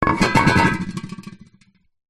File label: household flask thermo metal set down in side on wood wobble; zapsplat